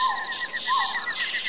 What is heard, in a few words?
JUNGLE; animal